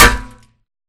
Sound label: Imp Bullet Light Ballistic Solid Metal